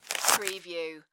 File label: Money Count Bill Single